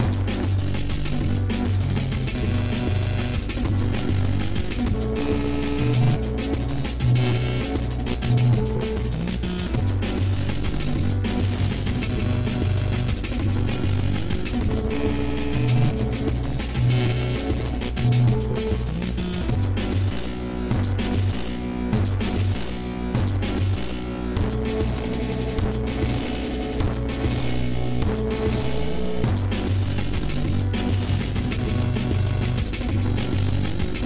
singing glitch

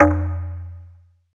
Balafon; Synthetic's Serum Collection; Echo Sound Works Sphere

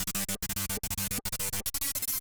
synth

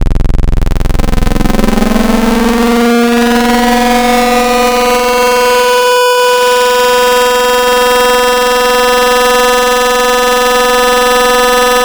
Saw Mod; Synthetic's Serum Collection; Cymatics - Nuclear for Xfer Serum Wavetables

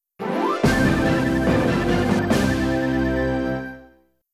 Congratulatory Message; Wii Room Soundtrack; nintendo

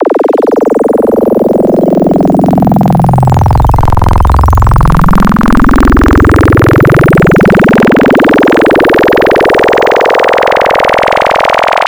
Fm Sweep II; Synthetic's Serum Collection; Cymatics - Nuclear for Xfer Serum Wavetables

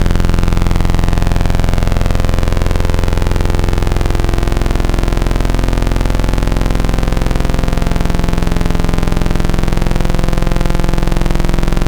Saw Dual; Synthetic's Serum Collection; ESW Core Wavetables; Echo Sound Works Core Tables

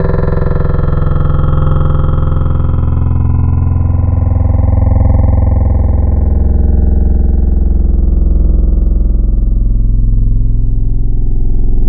Vocal Ahhh; Wavetables; Synthetic's Serum Collection; ESW Core Wavetables; Echo Sound Works Core Tables

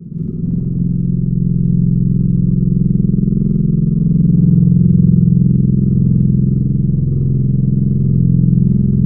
Lofi Strings; Synthetic's Serum Collection; Surge Sounds - ASTRO - Serum Wavetables

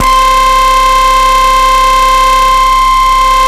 Axis Lead; Cymatics; Noise Osc Samples for Serum

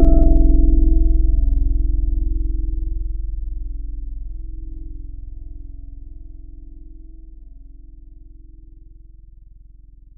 E Piano C; Synthetic's Serum Collection; Surge Sounds - The Sky - Serum Wavetables

